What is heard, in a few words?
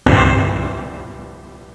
Tang